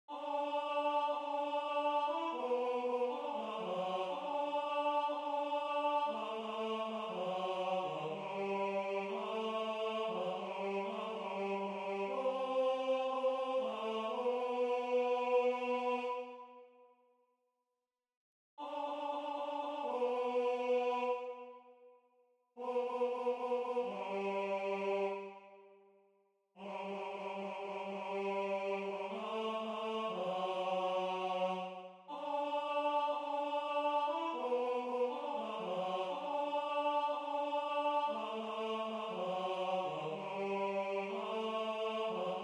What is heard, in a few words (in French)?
Tenor; A Toi puissance et gloire